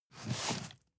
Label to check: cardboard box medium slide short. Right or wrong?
Right